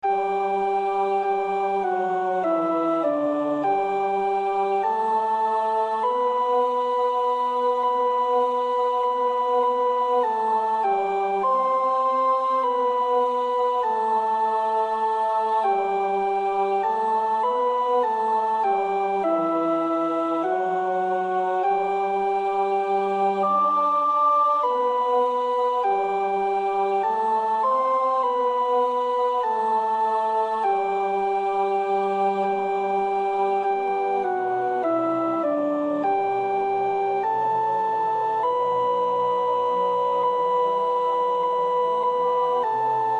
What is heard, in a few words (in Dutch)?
Psalm 134 sopraan; Koelewijn (Bert); Oefenbestanden